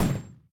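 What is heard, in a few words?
explosion hit; AssaultCube; Alien Stars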